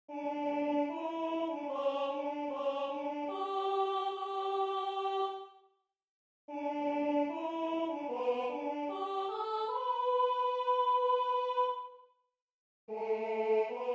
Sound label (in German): Sopran langsam